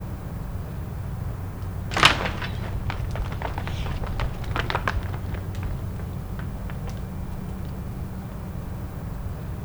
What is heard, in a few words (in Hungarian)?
agancsok egymásnak feszülése, rénszarvas; nyiregyhazi zoo 2010; szelvedo